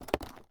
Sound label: attache case open